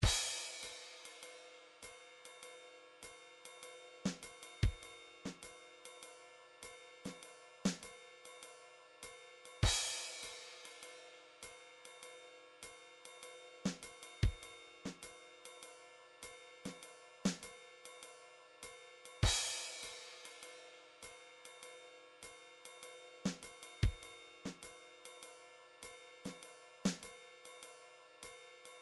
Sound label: drums swing, midi drum patterns